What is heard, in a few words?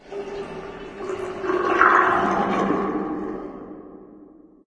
ambient water drain; video games; nature; source engine; portal2